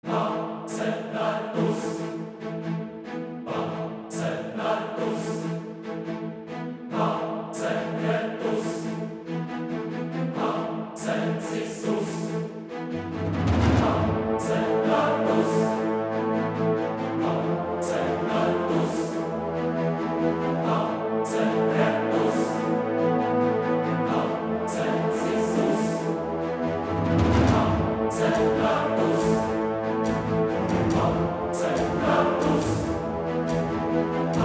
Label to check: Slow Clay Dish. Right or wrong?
Wrong